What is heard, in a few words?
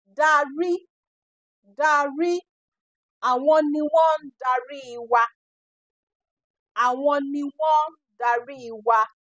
lead